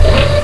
Mech

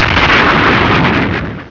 die; VERITECH

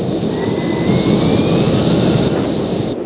GEAR

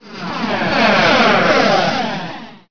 Flyby

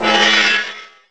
powerup; MAXOVER

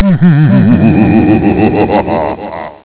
cackle; Rol Crusaders (Shareware) [game] - Occam

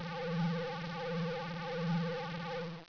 BALL; TYRAN

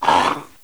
dog death

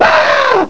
AAARGH

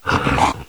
sight, dog

